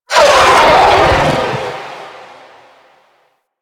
Sentient Vocal Death, Enemies; Warframe; Sentients; Ortholyst

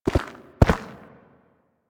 Raise Hand Steps, Forest Night; Warframe; Sacrifice; Ballas

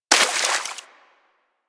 Bullet Impact Debris Water

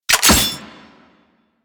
Sidearm Mech Charged, Weapons; Warframe; Tenno; Wraith Sidearm

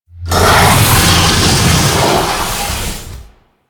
Forest Water Pump Retract; Ambience; Props; Warframe; Grineer Forest

